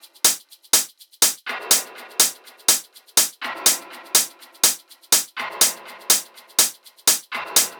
hats 123, Hat Loops; drum sample pack; Santorini; SEISMIC; Slate

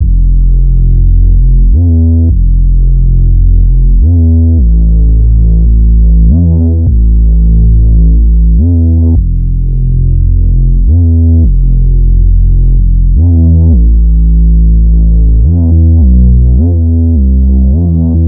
Synth loop 105 Am; Synth Bass Loops; Slate Digital BASSHOLE Sample Pack

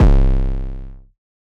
808 Bass Straps C3; Slate 808 Deep Dive; SD Signature 808s

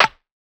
perc sticks; Hip-Hop; Drum One Shots; SD CAICOS Hip-Hop Sample Pack; Slate

